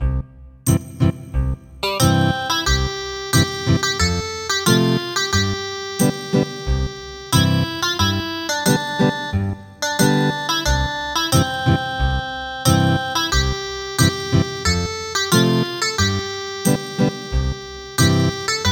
Ethnic Guitar; Thai National Anthem

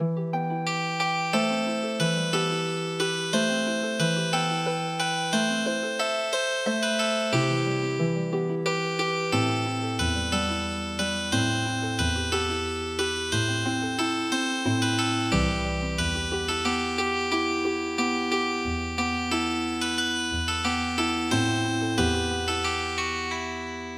Healing Harp; Mamasani

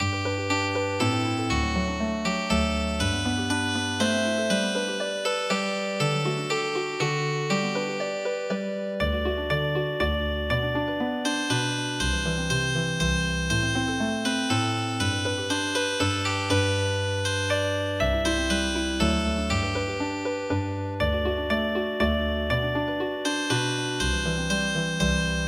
Old Swiss national anthem Healing Harp

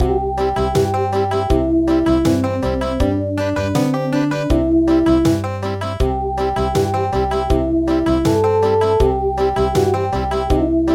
Country; Chick

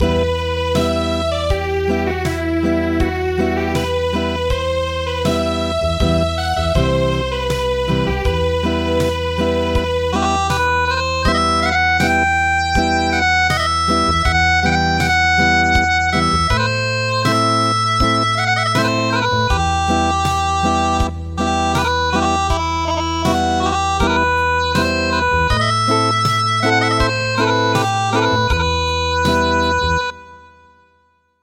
Acoustic; Danchone Bushi

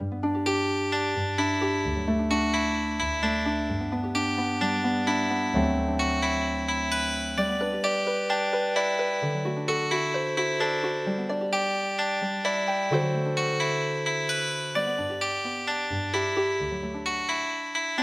Healing Harp, traditional

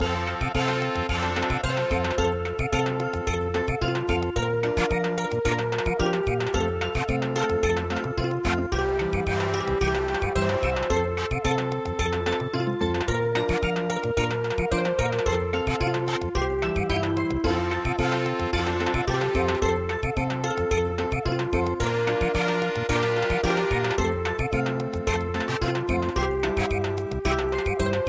Samba; AikinDrum

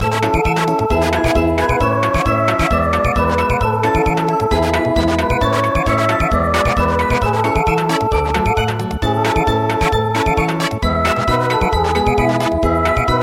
Samba

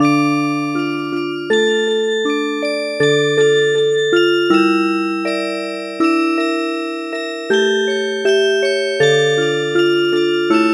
Music Box, lullaby; europe

